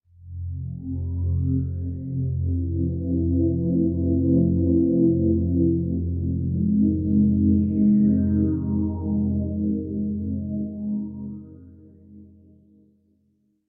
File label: Music Underwater Find; TR4